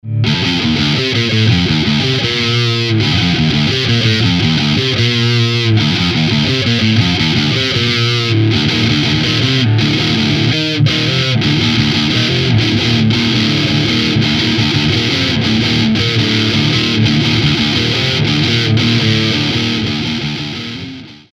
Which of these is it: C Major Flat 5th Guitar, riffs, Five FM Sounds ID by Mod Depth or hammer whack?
riffs